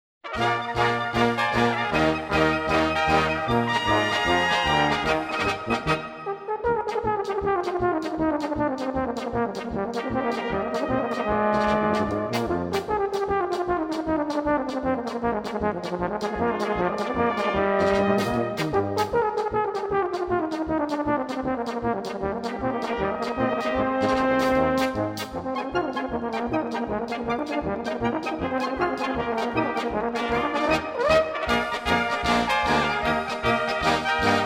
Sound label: Instrumental